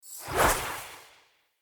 Staff Caster Cast Short; Weapons; Player; Staves; Starter

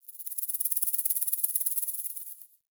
Forest Creature Rattle High; Warframe; Grineer

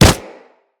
Kubrow Run Snow, Footsteps

